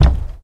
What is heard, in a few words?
Thump